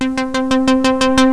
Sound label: repeat synth; jazz jackrabbit 2